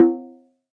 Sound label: conga Muff; ETHNIC and WORLD PERCUSSION